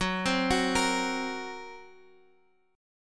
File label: F sharp Major Flat 5th F sharp Guitar Half Step Down